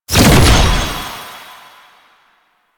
Research Pod Regenerate; JadeShadows; Ascension

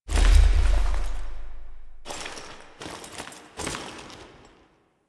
Cave Figurine Foley, Doll Assembly; Duviri; Teshin; Drifter

